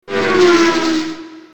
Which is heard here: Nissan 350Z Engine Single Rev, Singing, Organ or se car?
se car